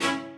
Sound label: strings